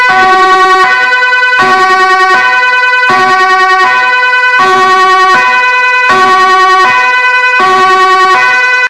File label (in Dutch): geluid sirene hard